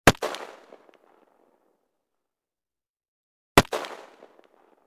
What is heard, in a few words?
gun arisaka; still north media